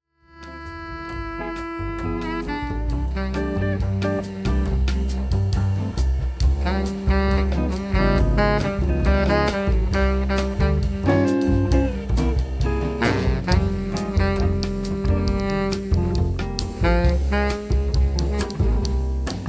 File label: Bossa